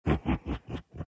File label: troll laugh; dota; troll warlord